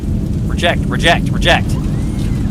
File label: reject